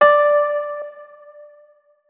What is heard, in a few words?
notes, keyboard, electric piano